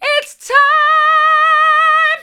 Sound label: it's time hard, vocal